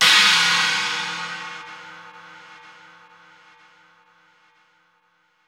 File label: China Cymbal; Roland BK-5